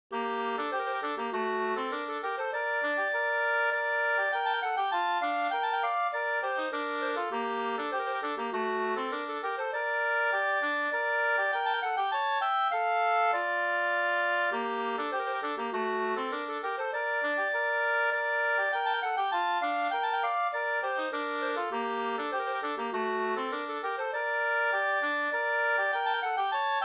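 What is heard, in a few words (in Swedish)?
polska, tvåstämmig; Rattvik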